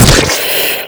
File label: antlion shoot; npc